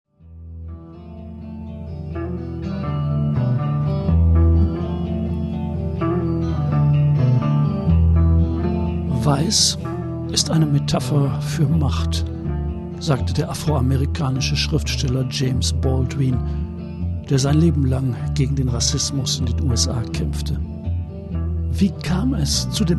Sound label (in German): Jingle; WeißSchwarz